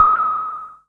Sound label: deep, Synths; Notes